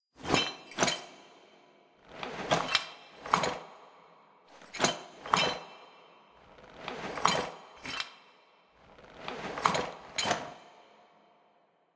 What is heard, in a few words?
workout squat